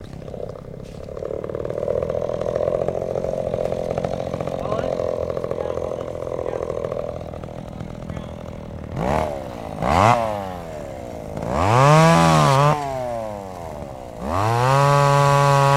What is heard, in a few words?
Chainsaw